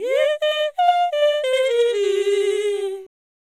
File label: Fem Vocal 128bpm G FX, African Voices